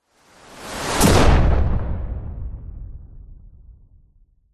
sound design cinematic whoosh into loud punchy explosion; zapsplat